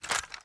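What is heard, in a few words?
Reload Movement; Valorant; guardian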